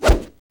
SWORD

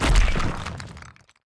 DROP Weapons AXE

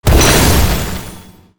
Summoner Punish Totem Regen

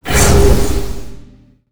Hard Blow

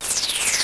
Whoosh

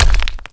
DMG STONE

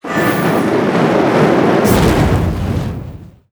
Fire Hale Cast

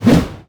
Whoosh

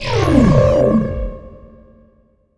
Mana Concentration

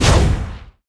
Effect Magic Hit

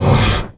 MON ZOMBIE

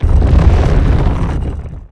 Dead Mob Stone

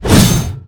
Attack

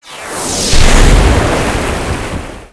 Water Protect

